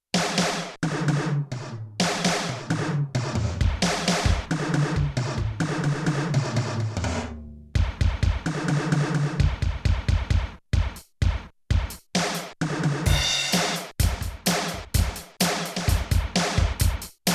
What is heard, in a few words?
Stereo Drums; Rock Performance 2